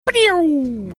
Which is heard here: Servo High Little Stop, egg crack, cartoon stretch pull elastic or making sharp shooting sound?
making sharp shooting sound